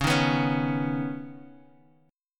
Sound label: C sharp 7th Suspended 2nd, C sharp, down, Guitar, Drop D